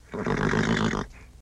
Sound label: Horse General Snort